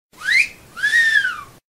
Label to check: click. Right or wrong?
Wrong